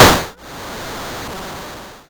Cwejman S1, Drum Machines; 300 Drum Machines; DevSnd Cwejman S1 - JK

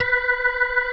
B4, PERCORG; Sonic Reality; Vintage Timetraveler

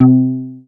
MOOG BASS C4; Best Service Dream Experience

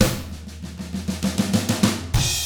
HARD ROCK, Drums; ILIO; Double Platinum Drums 1

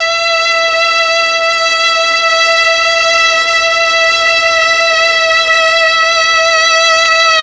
MEL STR, Legendary M400; ILIO; Propeller Island

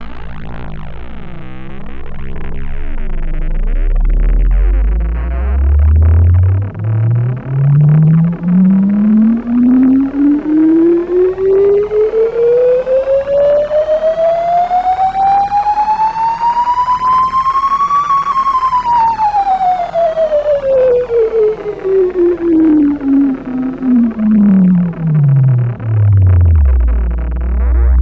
el blurps, special FX twisted; USB Soundscan; AKAI

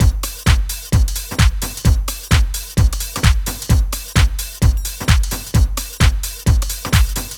DRM, LOOPS 130, House; Best Service ProSamples vol.10 - House; AKAI

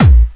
hardcore 1, bassdrum; Ueberschall; Techno Trance Essentials; DRUMS; BD HARDCORE2

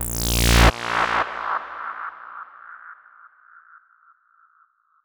Sci fi

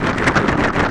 Flags; SNES; Shin Chou Kouki

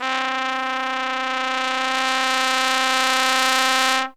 TRUMPET FX; Zero-G; Phantom Horns

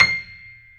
C6 piano; AKAI S-Series CD-ROM Sound Library VOL-8

